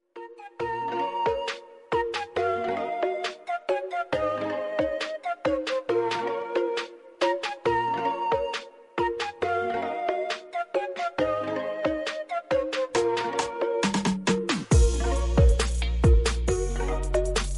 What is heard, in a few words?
Alarm Instrumental